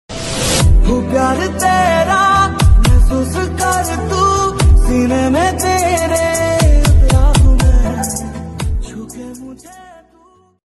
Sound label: sad ringtone, bollywood ringtones